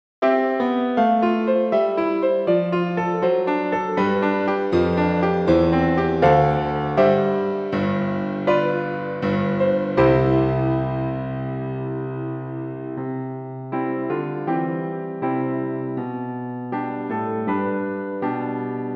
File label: bells piano